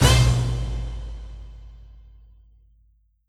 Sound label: Kurzweil K2000 Orch Hit